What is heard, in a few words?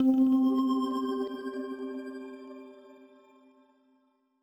Achievement Sound; Spectrum